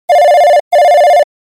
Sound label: ring ring